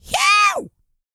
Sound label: E YELL, MALE HUMAN; R&B & Dance; ILIO; Vocal Planet